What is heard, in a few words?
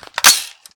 chamber press, weapons, m16; Urban-Coalition; Decay; arccw ud